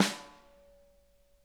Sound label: snare; Sonic Reality; Interactive Drum Kits